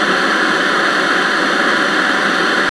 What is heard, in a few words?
television white noise